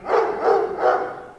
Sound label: dog; Mafie 1